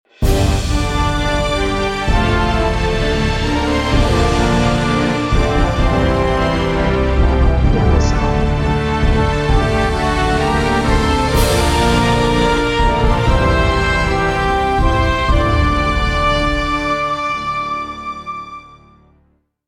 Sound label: Epic Victorious and Successful Happy Ending